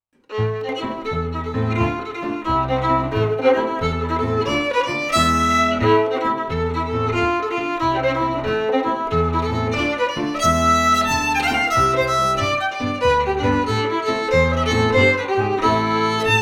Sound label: FIDDLE PIANO; Jink & Diddle Book o' Tunes 2021